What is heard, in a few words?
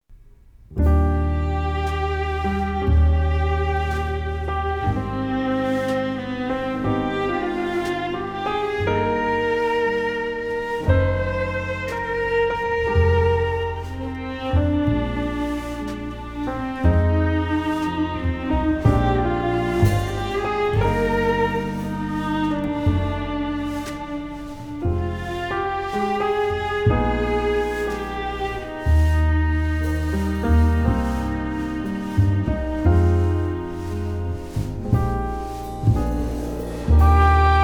Big Band Ballad; old school jazz standards